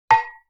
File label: Cowbell High Damp; Vintage Cartoon; Drums & Percussion